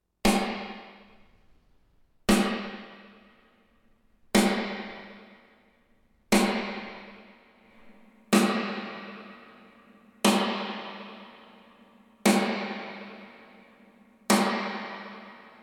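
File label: room reverb shortest to longest decay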